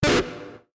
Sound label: fire long chord fail; Adventure Time - Elemental; ThrillGames